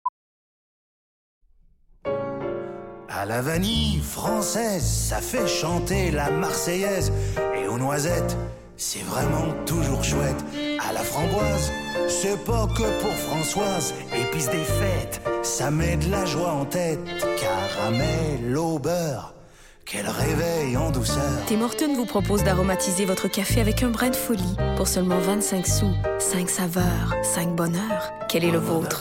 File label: chant; tim horton